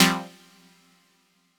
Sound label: stab noise F, stabs